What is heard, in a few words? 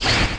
chain; RYL 2